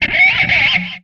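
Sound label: Music Guitar Pick Scrape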